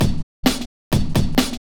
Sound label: drum loops; bb drum loops